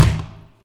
Hit Generic Plastic

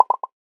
ui button thought; American Fugitive

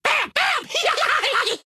Archer Headbang

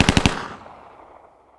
Weapon Machine Gun Truck Fire

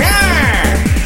myaah; Hunters and Props

